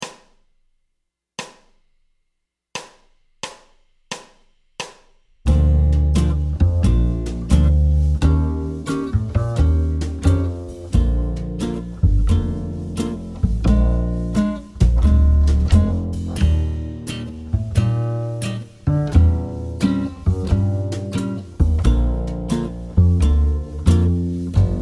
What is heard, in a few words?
Playback 88 bpm Blues Bb; osons charlie christian etape 36